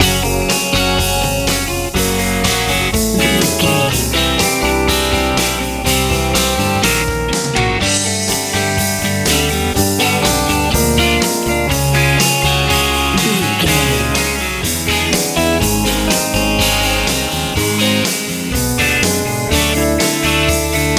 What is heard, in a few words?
fun nice easy rock